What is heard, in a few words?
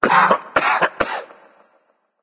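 nonverbal clear throat; smite; voicepack; merlin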